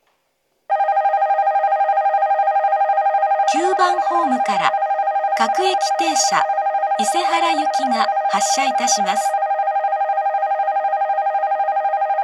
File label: local isehara, odakyu shinjuku; station